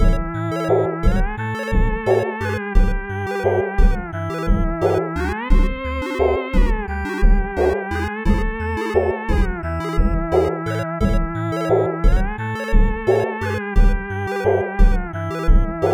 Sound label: melody; lexys-labyrinth